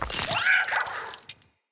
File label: Freeze Mote Vocal